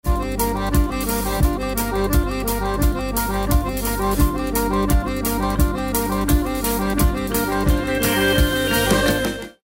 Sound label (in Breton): jingle dans akademi